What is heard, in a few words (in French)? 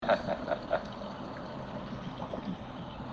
rire niais